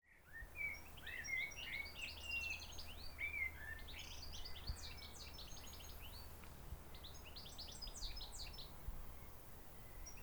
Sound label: birds at Auquainville down the hill; Holiday 2001 in France